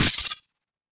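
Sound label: attack sword wave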